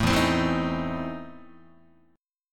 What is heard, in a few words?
E Major 7th Suspended 4th Sharp 5th, Guitar; EM7sus4#5 strummed